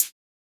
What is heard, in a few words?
Hit, Closed Hats